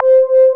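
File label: synth Analogy; Best Service Gigapack 1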